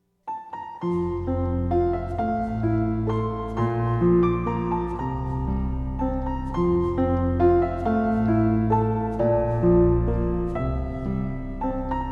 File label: Relaxing Piano Lullabies